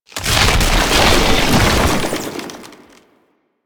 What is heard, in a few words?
Tank Infested Charge Recover; Enemies; Abilities; Warframe; Lasrian